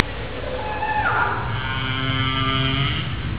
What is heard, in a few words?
whale sounds